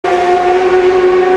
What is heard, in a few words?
S1000RR flyby